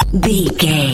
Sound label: retro code signal dailing